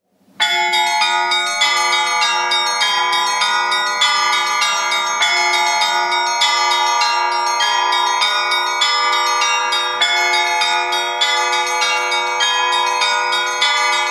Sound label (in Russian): zvon